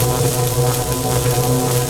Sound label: electricity; firefight